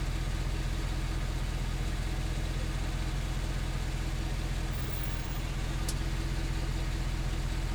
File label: vehicle pickup idle